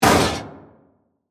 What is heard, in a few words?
Hit Metallic Slam; Sound Design; BlastWave